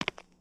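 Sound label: Foot Concrete Sneaker Walk; footsteps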